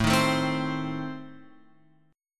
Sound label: A Diminished A, chord shape; Adim Chord {5 6 7 5 X 8} strummed